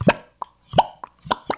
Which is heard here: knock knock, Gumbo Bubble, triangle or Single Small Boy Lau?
Gumbo Bubble